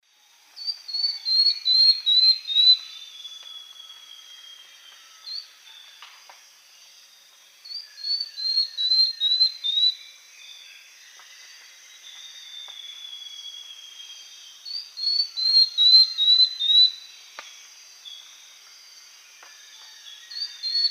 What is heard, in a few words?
Sclerurus mexicanus, song; S. America; Eastern Lowlands